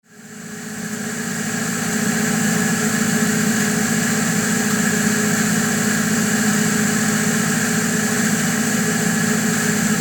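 Vintage Vaporizer, vintage humidifier noise ambience; Hankscraft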